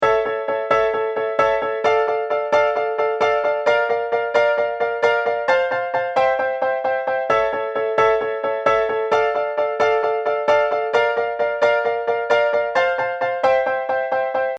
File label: rh piano riff